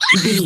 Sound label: voice fun creature small mutant voice emotes